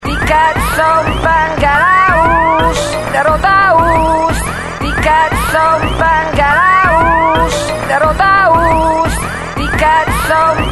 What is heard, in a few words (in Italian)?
Suoneria